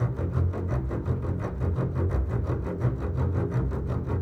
Bass, Orchestral; Sonic Foundry (Sony Creative Software); Orchestral VOL-2

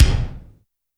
kick, Roland R-70, BD

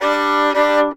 FIDDLE FX; Sampleheads; Whole Lotta Country 1 & 2

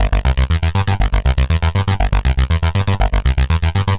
Arpeggio Bass, Prototechno

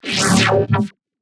Neuro, E, bass

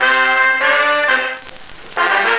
Old Scratchy Sect, Effected Horn Section; Sonic Foundry (Sony Creative Software); Jazz Solos and Sections

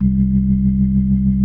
C2, B3

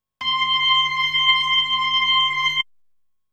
B3 Organ; Masterbits CLIMAX 8

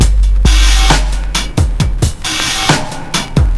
67 bpm, breaks; Slomo; Zero-G Beneath the Planet of the Breaks

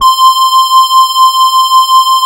Poly800 C5, Korg; Back In Time Records; Korg Universe VOL-1

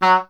WND E. Horn; Miroslav Vitous; Solo Instruments

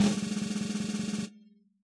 snare roll, Yamaha DJX Rock Kit

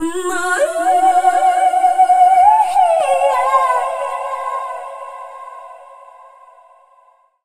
Dance Vocals, FEMALE; Best Service ProSamples vol.12; AKAI

